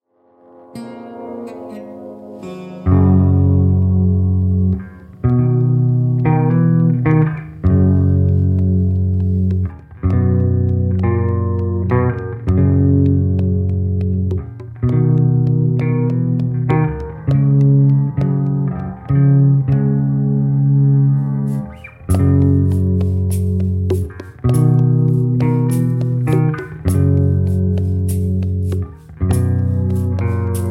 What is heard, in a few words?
RootsRock